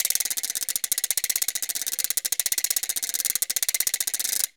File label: RATCHET ROLL; ILIO; Synclavier Percussion 2; World & Orchestral